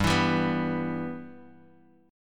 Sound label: G-6th-G-5,7,5,4,x,x down Guitar Drop D